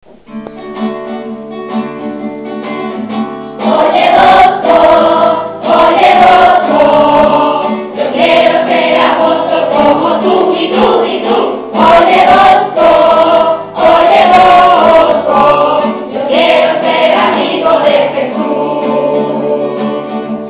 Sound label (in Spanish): himno infantil